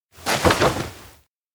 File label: ride bike; anemoi